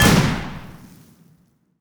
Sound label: fireworks gatling gun; Fireworks Corki